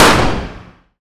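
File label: fire distant 45acp pistol interior; gmod; arccw uc commons attachments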